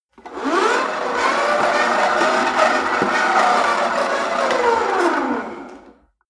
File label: rope trainer pull sequence fast long; sport freizeit; fitnessstudio